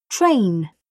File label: train